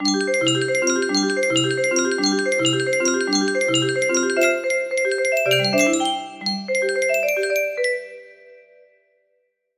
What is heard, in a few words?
melody, music box